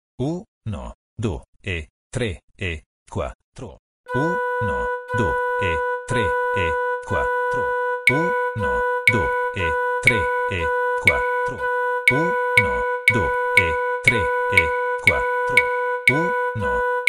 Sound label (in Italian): esercizio ritmo